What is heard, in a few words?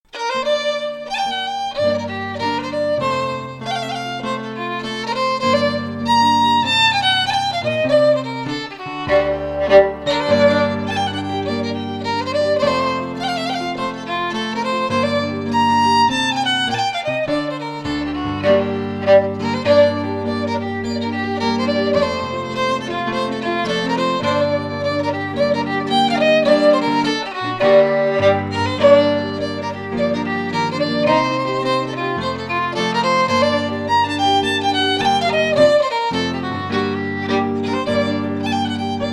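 Reel, Scottish Fiddling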